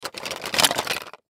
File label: Toy Briefcase Rummage Fast; Miscellaneous Cartoon; Foley; Blastwave